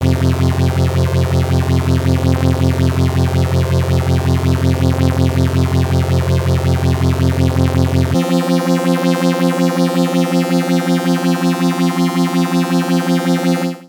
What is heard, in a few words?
prodigy stereo polyphase